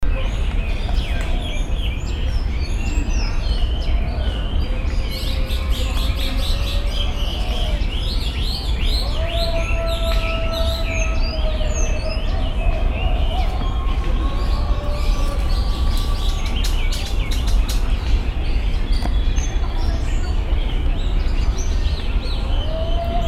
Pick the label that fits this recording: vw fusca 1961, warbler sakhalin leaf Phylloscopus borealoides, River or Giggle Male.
warbler sakhalin leaf Phylloscopus borealoides